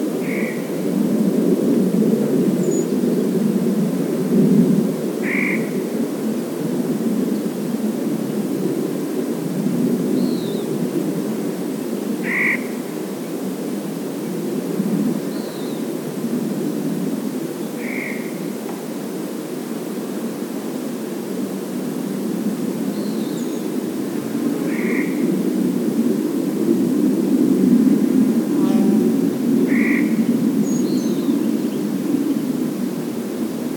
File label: Clark's-nutcracker, پرنده فندق شکن کلارک